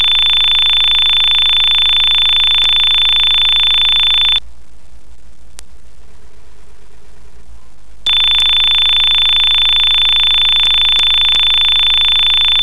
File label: bell tone